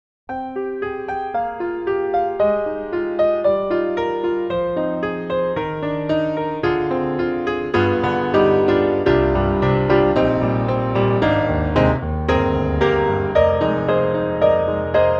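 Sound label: Steinway D, Main Mic, piano; Embertone; Walker 1955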